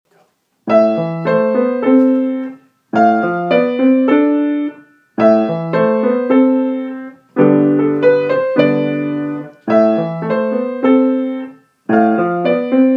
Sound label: A Minor Overdrive